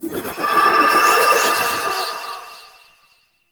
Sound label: Wraith Pre Death Fly Up; Enemies; Warframe; Zariman